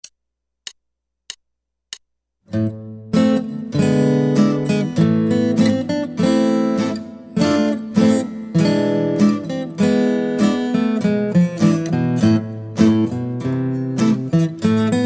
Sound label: Guitar